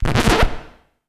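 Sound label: scratch, record; XP RECORD-FX; Best Service; XXL Production Effects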